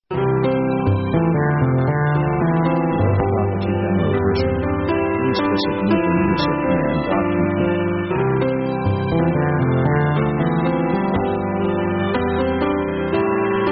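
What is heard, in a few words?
pop orchestral; victory themes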